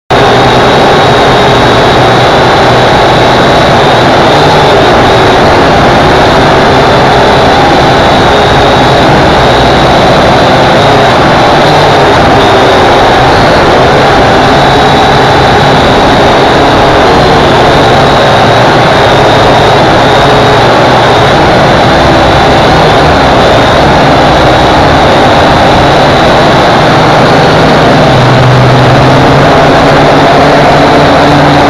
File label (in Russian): zvuk kvadrokoptera